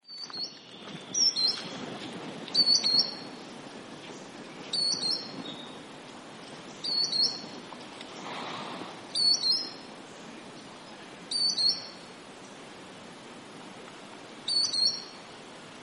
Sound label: Varied Sittella